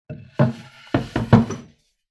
まな板を置く音

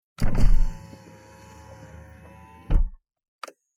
車の窓を開ける音

